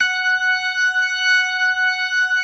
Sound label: F#5S, B3, Vintage Keyboards